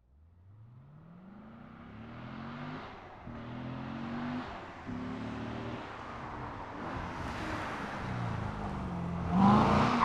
corvette turn and drift ext, Corvette C7; Chupapsound

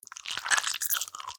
Splat Splatter; SoundBits; JustGore

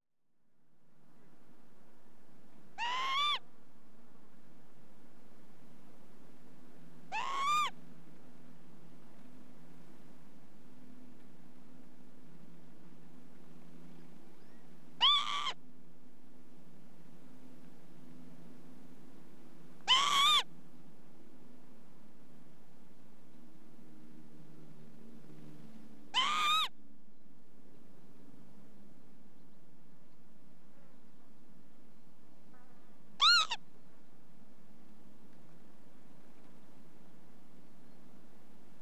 Lapwing, Female Ground Anxiety Ca; Birds; BBC Sound Effects Library

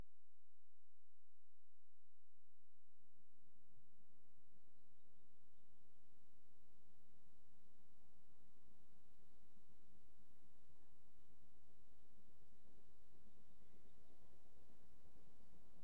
Train Passes High Speed With Whis; BBC Sound Effects Library; Age Of Steam

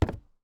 FOOTSTEP - Metal Board Walk Barefoot Male; Timothy McHugh; Barefoot on Metal

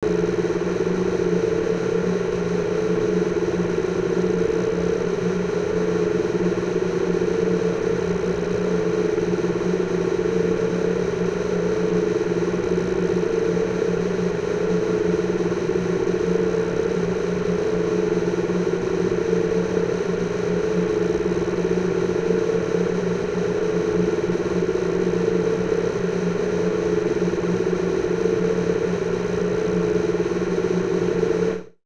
Ambience Scifi Alien; Wakerone

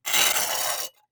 Scrapes and Scratches, Metal; SoundBits

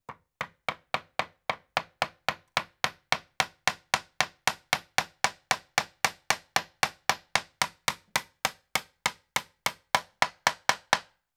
Tack Nail Hammered; Construction; BBC Sound Effects Library

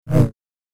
Double Bladed Saber Sound; FXHome

